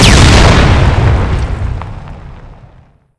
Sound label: hegrenade explode; cstrike; grenades